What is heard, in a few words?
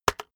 keyboard external enter hit fast